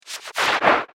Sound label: Abstract Friction Machine; Machinery Sound Effects